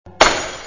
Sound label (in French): texture verre casse